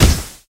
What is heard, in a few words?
hit; akira